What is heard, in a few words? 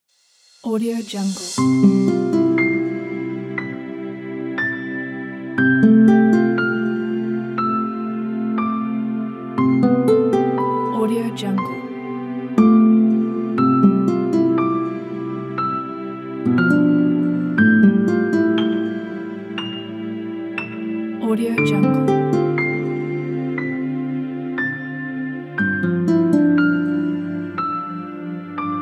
inspiring piano and guitar